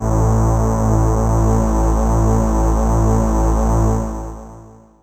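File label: synth A1, Ensemble, FAIRLIGHT; Best Service ProSamples vol.55 - Retro Sampler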